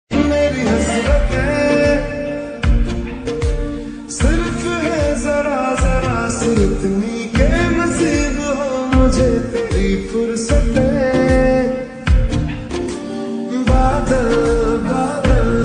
boy playing guitar; green screen audio meme

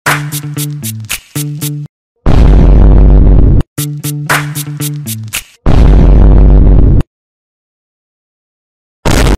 vine boom; eyebrow cat; audio meme

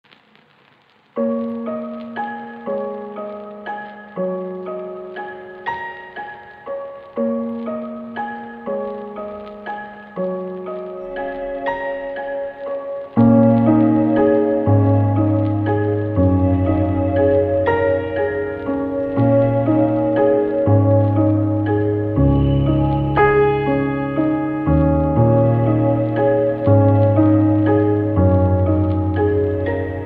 girl walking and crying; audio meme

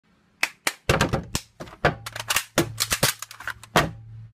cup gun song; green screen audio meme

